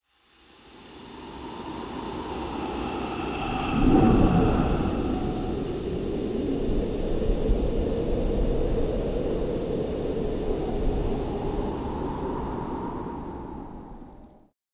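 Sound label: air, ambience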